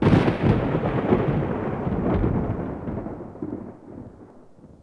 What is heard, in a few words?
Thunder